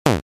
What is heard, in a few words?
Retro 8-bit damage